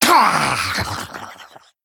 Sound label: Male Human Death